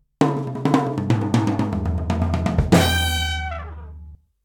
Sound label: Fireworks sting; minecraft story mode gamerip 2016; Order of the Stone